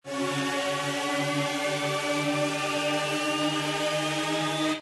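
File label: respin Sound; jackhammer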